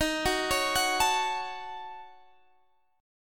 Eb Minor 7th Flat 5th Eb down Guitar Standard; Ebm7b5; arpeggiated; JGuitar

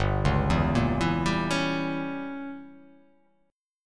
G Diminished 7th

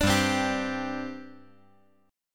G Suspended 4th

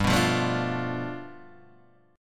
G 9th, Guitar Open D

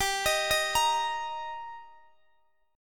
G Minor Sharp 5th G Guitar DADGAD, chord

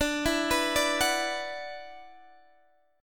F sharp 7th Suspended 4th Sharp 5th D

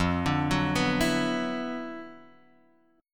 D 6th Add 9th F, Guitar, Standard; D6add9/F arpeggiated; JGuitar

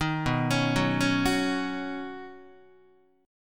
B Major Add 9th B Guitar; Badd9; arpeggiated; JGuitar

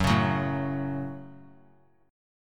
Gb Minor Gb; strummed; JGuitar

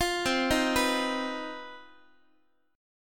C Minor Major 7th Double Flat 5th chord, Guitar; arpeggiated; JGuitar

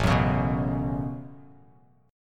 A 6th Add 9th A down Bass Standard; A6add9; strummed

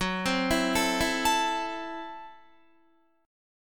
F sharp Minor 7th Flat 5th, Guitar; F#m7b5 arpeggiated; 14,15,14,14,16,16; 4E,4A,5D,5G,5C,6F; chord shape

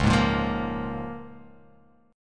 C sharp 6th C sharp down

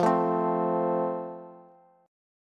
F Major, Bass; strummed

